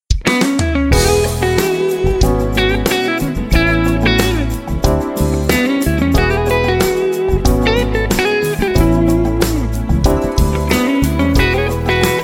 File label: Compressor Song